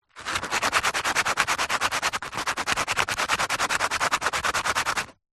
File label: industrial sand paper sand plastic rubs